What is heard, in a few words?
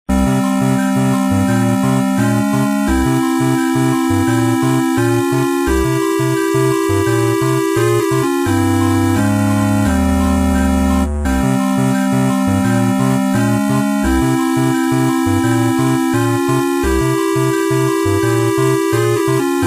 In Game Music Ghost Mode 20 Second Timer; Kahoot